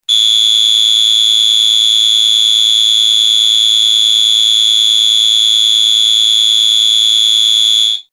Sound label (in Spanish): tono